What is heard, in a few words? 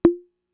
Conga, Fricke MFB-522